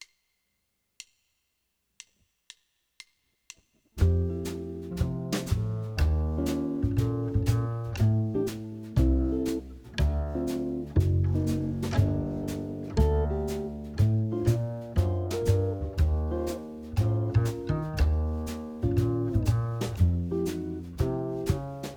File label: Jazz Solo Backing Track